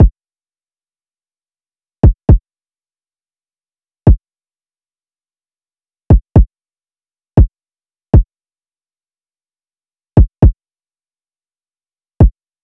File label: Drum Loop, 118 BPM Kick; Loop Stems; Cymatics; Vibes II Drum Loop Collection; How So Drum Loop